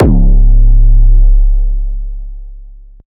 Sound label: 808 C#; Heavyweight; premium 808 sample pack